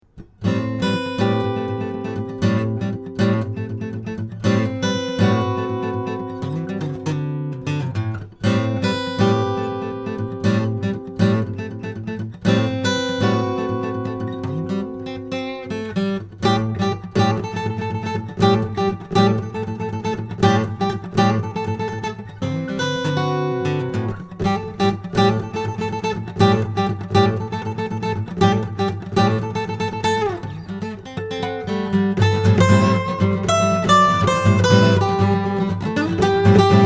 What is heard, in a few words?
Am7 guitar